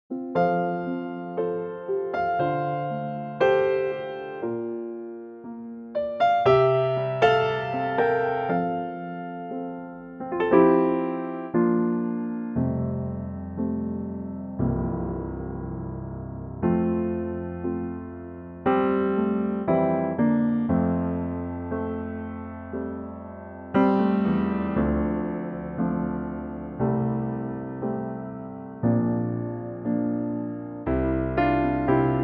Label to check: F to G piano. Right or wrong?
Right